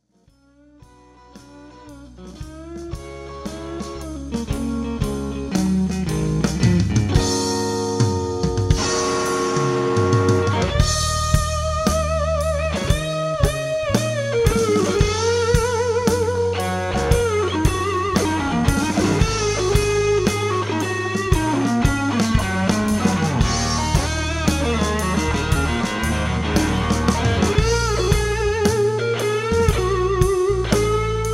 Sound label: lead